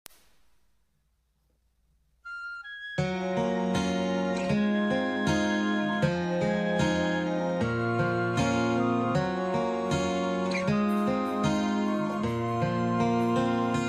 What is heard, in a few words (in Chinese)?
翻唱